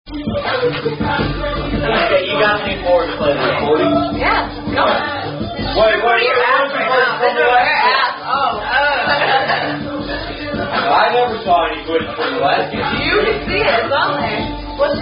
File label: Drinking Game; Moose Party